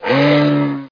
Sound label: frog sigh